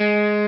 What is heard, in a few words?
Arp.